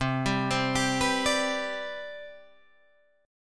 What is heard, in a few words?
C Major 7th Suspended 2nd C down Guitar Drop D, chord